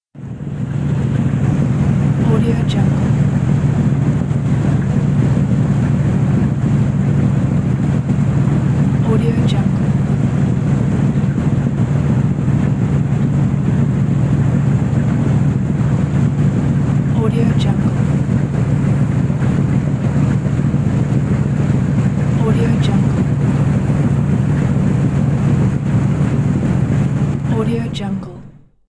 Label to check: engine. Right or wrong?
Right